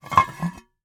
Cookie Jar, ceramic, glazed, irregular shape, hollow base, hollow top, rough, resonant, tonal, open; fast; Eiravaein Works; Vaeyan IV